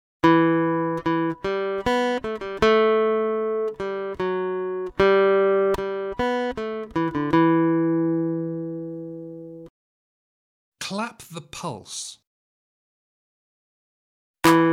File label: Aural Rhythm Test, acoustic guitar; Grade 4